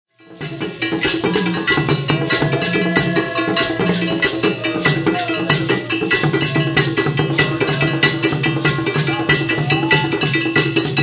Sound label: atumpan, ghana, ashanti